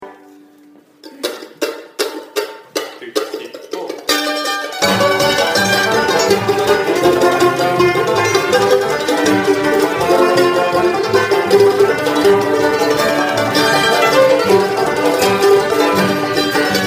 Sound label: bluegrass breakdown